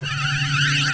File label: RAYGUN; HIGHTECH